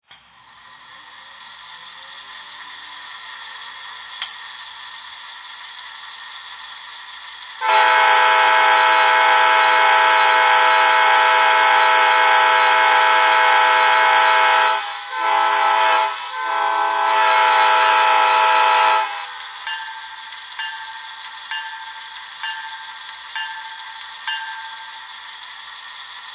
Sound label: aem7, trains; amt